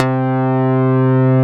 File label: Synth Bass, Analog Bass